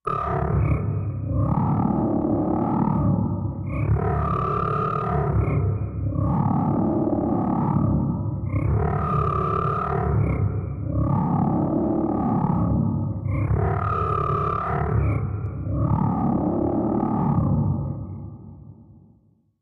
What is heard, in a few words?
Auto Anger Machine Low, monster